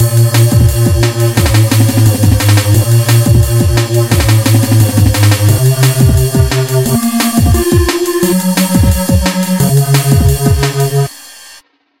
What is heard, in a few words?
Dub mix